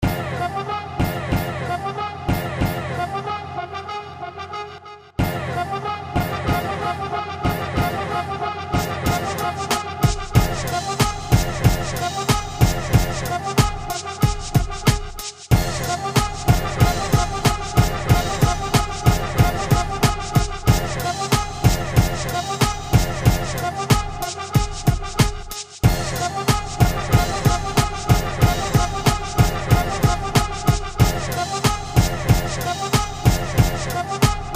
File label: battle call; Puppet Macbeth; Kronauer Space at Adams House (Harvard University)